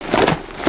equip rattle; creatures organic

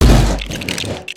cans plastic; destructibles

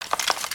idle rattle; creatures organic

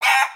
cluck; creatures organic

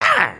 Get Hit Oneshot; creatures organic

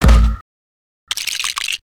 on death, creatures organic

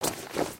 Change Pants, foley

